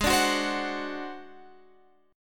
Ab 7th Flat 5th down Guitar Open F; Ab7b5 strummed; Ab7b5 Chord

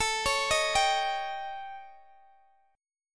A 7th Flat 5th Guitar Open G; x,x,19,18,16,17

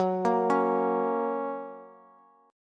F sharp Major, Bass; arpeggiated; JGuitar

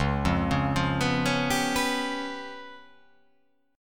C sharp 7th Flat 5th, chord

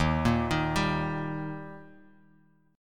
Eb Major Eb Guitar Drop D

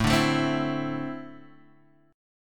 E 7th Suspended 4th Sharp 5th

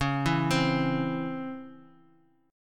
Db Minor Major 7th Db

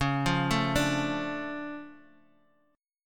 D Minor Major 7th Db

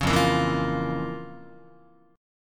F Major 7th Suspended 4th Sharp 5th F, Guitar; FM7sus4#5 strummed; JGuitar

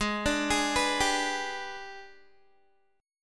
Ab Minor Major 7th Flat 5th, down, Guitar; arpeggiated; AbmM7b5

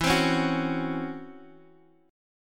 C sharp Minor Major 11th E

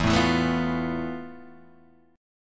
F Major Sharp 11th E

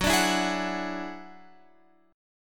Gb 7th Suspended 2nd Sharp 5th, Guitar, Open F; strummed; JGuitar

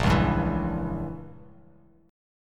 Bb Diminished 7th Bb Bass Standard; strummed; JGuitar

